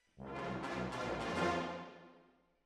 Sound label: Hall, Jazz for piano